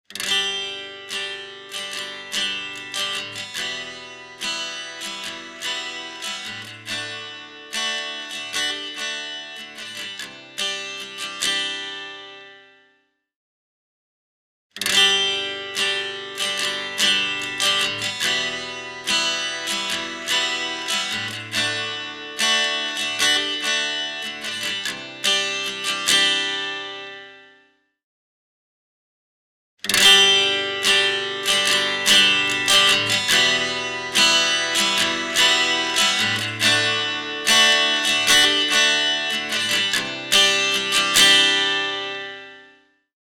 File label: Acoustic Guitar